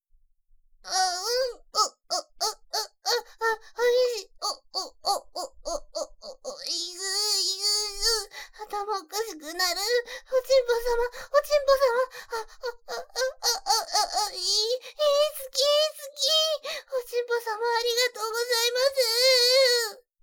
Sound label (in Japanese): あぁん あっ あっ あっ, バイノーラル